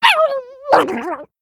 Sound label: Murloc Death